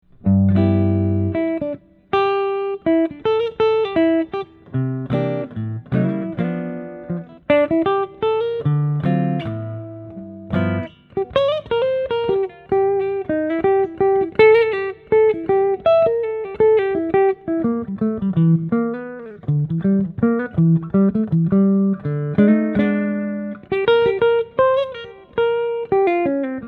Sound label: rivera jazz suprema